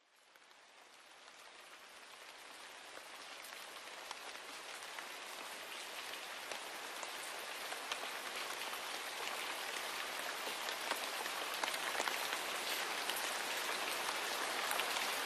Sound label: rain sound